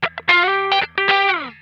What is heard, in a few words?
GLIDE, Funk Guitar; Zero G - Funk Guitar